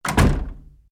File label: Door Combine Close Mix